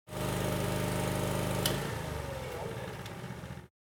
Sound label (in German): Presslufthammer